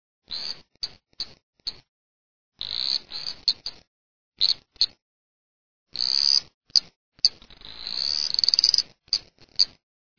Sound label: Litoria fallax